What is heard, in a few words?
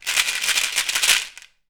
SHEKERA, African perc